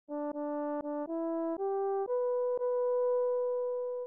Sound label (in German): Rhythmus Ubungen; Notenlesen lernen